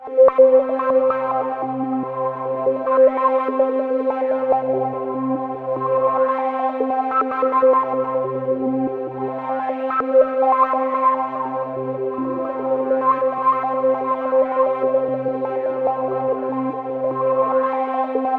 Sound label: C3, SynthLine; NiceBeats